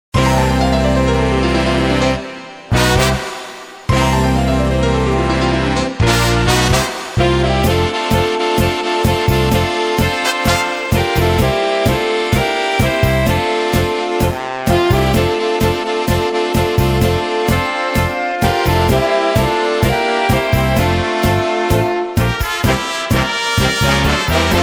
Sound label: Tango